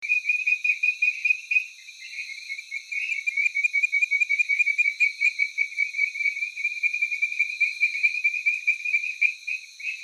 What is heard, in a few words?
Chirixalus idiootocus, tree frog of Taiwan; 面天樹蛙